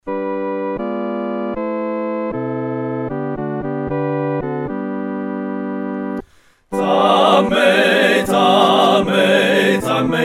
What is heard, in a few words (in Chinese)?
合唱 四声部; 赞美耶稣